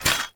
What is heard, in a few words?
Footstep Default; Warframe; Hoplite